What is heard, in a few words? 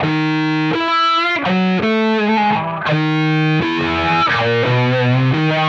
Electric Guitar, Loops; Guitars